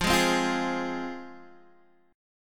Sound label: F Minor, Guitar, Open D